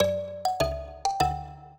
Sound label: minuet, mbira; Mozart